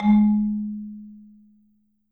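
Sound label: Marimba medium F G#2, marimba; Sampleheads - Dave Samuels Marimba & Vibes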